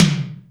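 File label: Md Stereo, Alesis D4 drum machine tom; 300 Drum Machines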